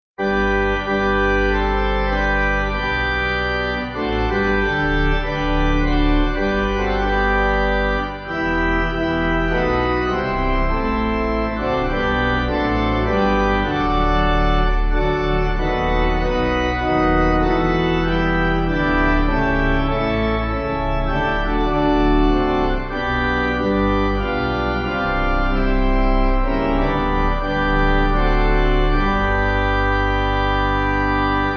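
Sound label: Pipe Organ, Music Backing Files for Churches; O Worship The Lord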